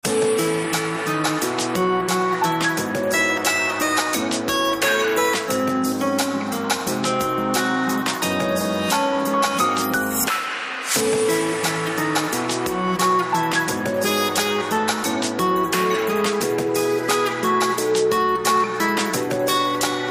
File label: Duet; LG VS750 Fathum